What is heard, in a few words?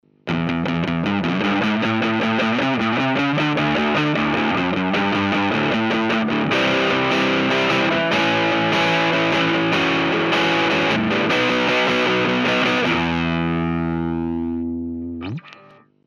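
Jumbo Fuzz, Line 6 M13 guitar processor; Vox Tone Bender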